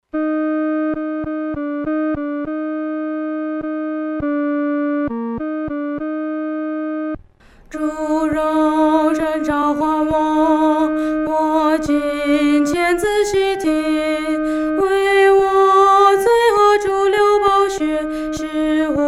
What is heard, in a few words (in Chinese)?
独唱 第二声; 主，我来就袮